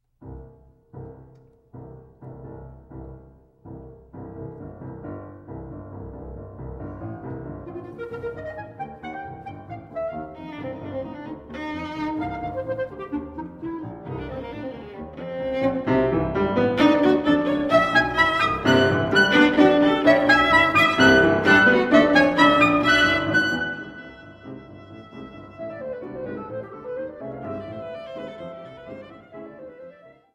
Trio Dance; Celebration

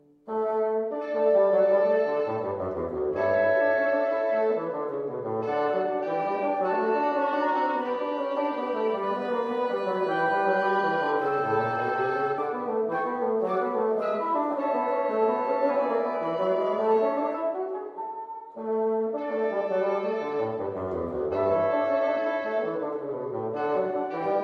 Quintet in A Minor, Variations bassoon

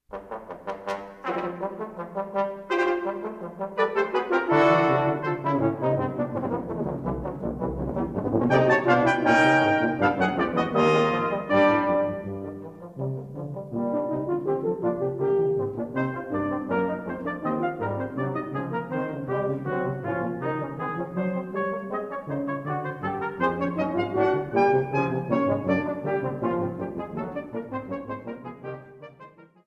Brass Quintet; No.1; VI.Finale

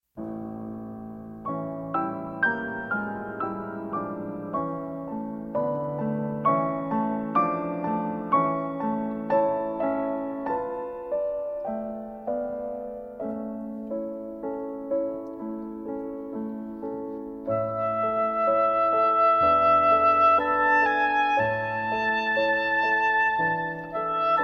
Trio for Flute, Oboe, and Piano Andante semplice

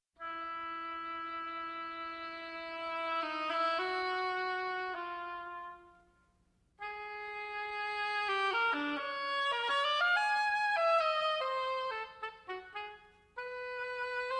Parable for Solo Oboe

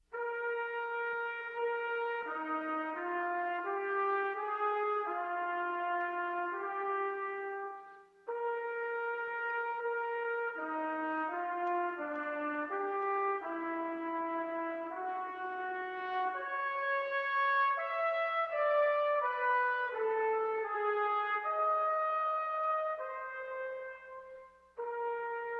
Five Trumpets; Reynolds: Music for Five Trumpets; II. Chorale; CRYSTAL RECORDS

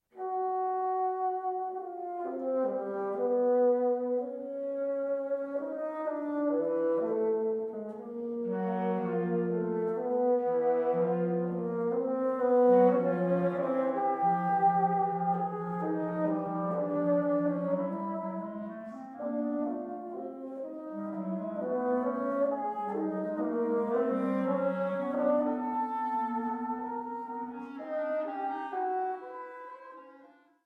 Woodwind Quintet

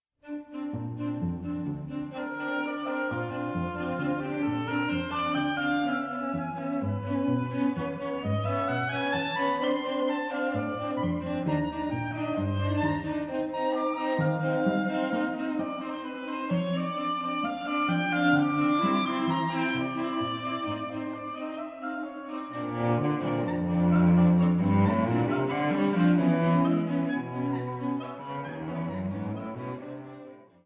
Quartet Allegro non troppo

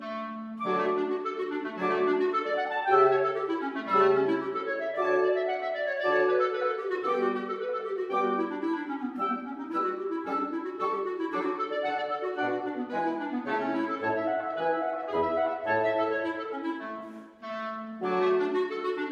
Quintet in A Minor, Variations clarinet

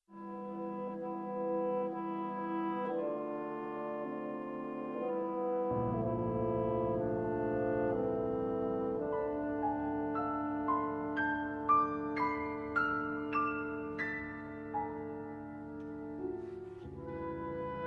Two pianos and Orchestra Andante; Concerto

